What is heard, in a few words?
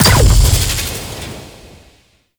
Shield Arm Break Explosion; Ambience; Props; Warframe; The New War; Sentient Devourer; Orphix